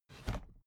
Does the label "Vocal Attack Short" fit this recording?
No